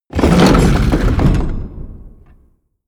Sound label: wood grind large; industrie wirtschaft; baugewerbe